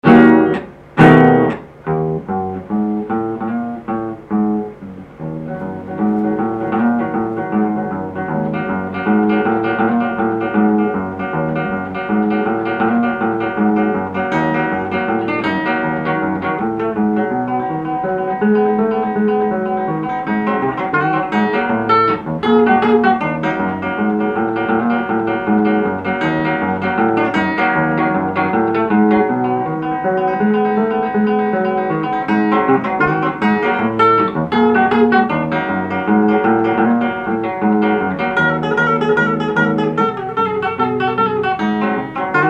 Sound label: Rock Roll